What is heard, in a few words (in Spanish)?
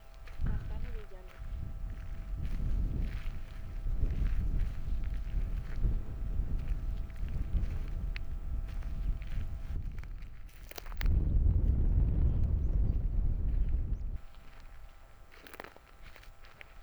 EMBALSE